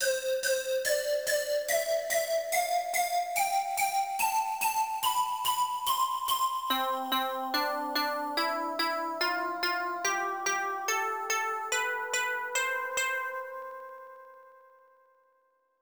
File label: Stereo Check; Golfing Greats 2; konami GX arcade